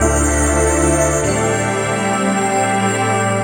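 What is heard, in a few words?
Pad Sine pad, Drum & Bass Pads; Sonic Foundry (Sony Creative Software); Knutrix Drum & Bass; Keys Pads 1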